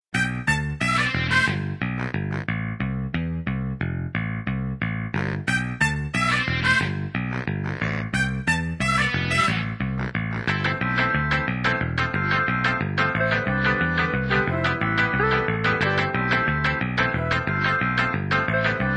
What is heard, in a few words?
rhythm